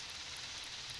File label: Background Noise